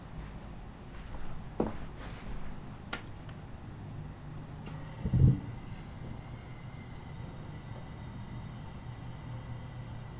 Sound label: heater noise